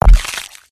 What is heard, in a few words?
footsteps, run